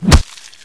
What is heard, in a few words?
claw strike, fast zombie; hl2